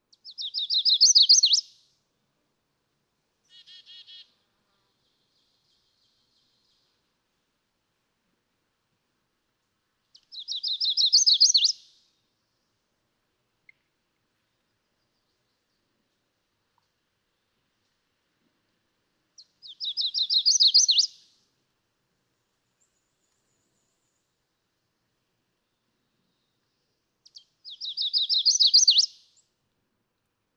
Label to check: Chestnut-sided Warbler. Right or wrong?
Right